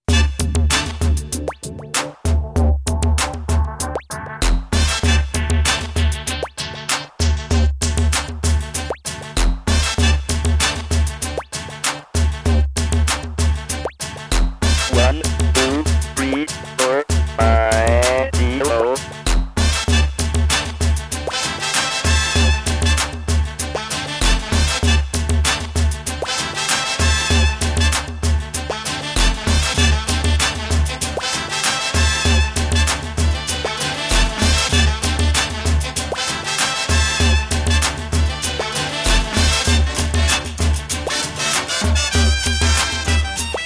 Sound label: Cyber Mambo